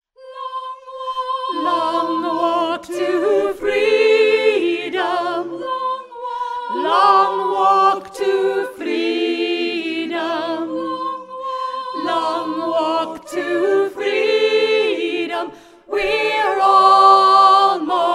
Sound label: Alto